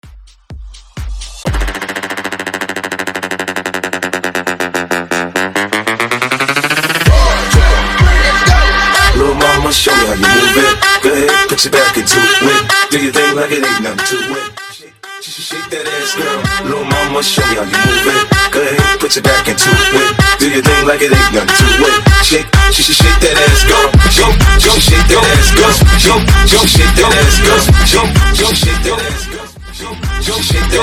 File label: Tech House 128 BPM